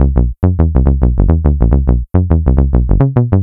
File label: Bass Line; Eclectic Electronica